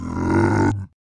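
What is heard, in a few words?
zombie pig angry; minecraft; Votive World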